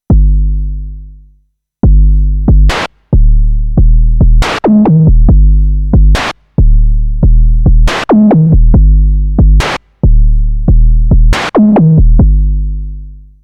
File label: Korg minilogue xd